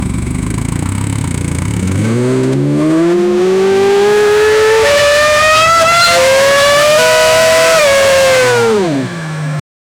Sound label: a v6 turbo hybrid modern, race car